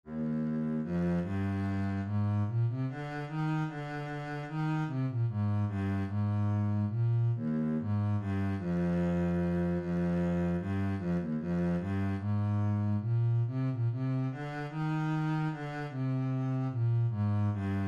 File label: minuet bass